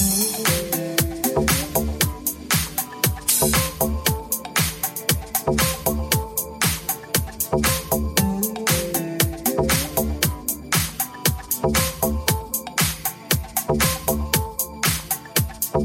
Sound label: Hip Hop Guitar Version Ringtone; Samsung